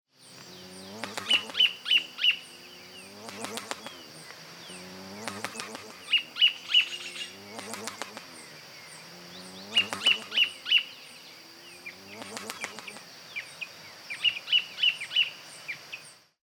Som Beija flor mosca

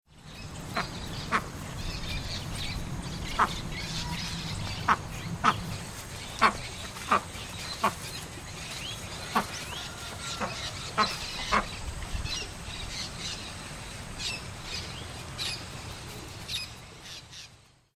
Canto Pato do mato

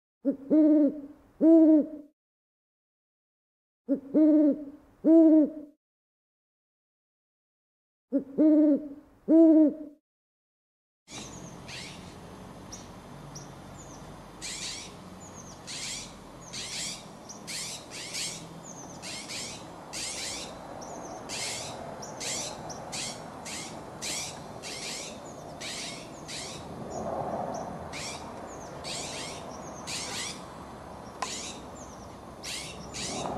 Som Coruja ana

